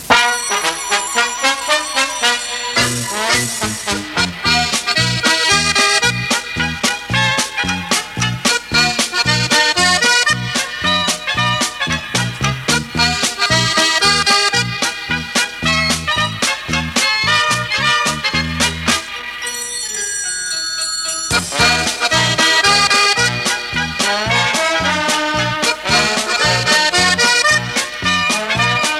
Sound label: polka